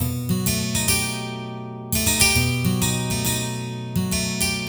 12 String Guitar

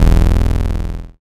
BAS MKS-50, Roland

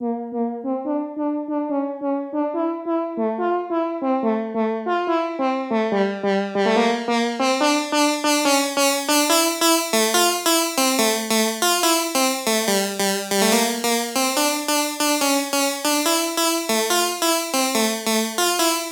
PWM Kit 142BPM Verse - PWM Sound DRY, Gmin; Constr Kits; Melody & Synth; Vengeance; Essential Clubsounds Vol.5

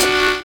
HIP SAX; Univers Sons

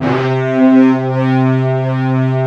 big disto; East West - Global Sounds

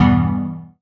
stab brite F, Noise; stabs

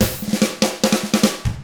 Double Platinum Drums; 50S ROCK

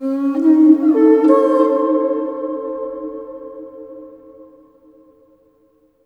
HRNPHR, Heart of Africa; AMB. HRN FX

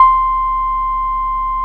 SIEL, String Machines

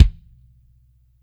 DEEP DRY, BG KICKS; Spectrasonics; Burning Grooves

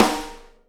SNARE RIM, Live Snares; PlatinumPhattCD1; E-MU Producer Series Vol. 8 – Platinum Phatt

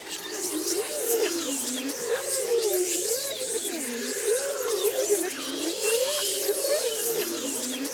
WHISP REV, whispers

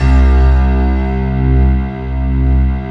STACKED, STACK PNO

